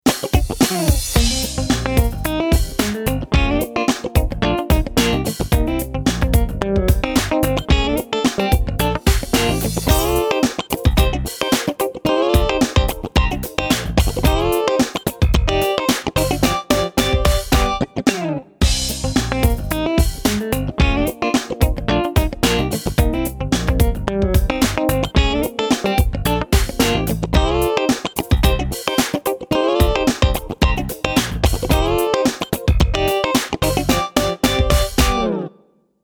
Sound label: E Guitar Clean